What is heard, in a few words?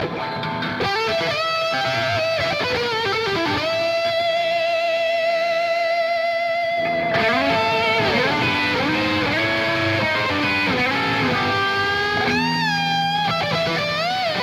guitar battle; ringtone